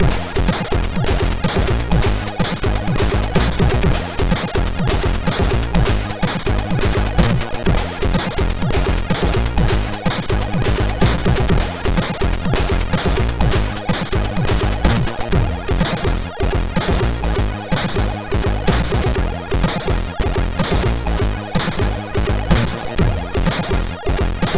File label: House, sid MOS6581R4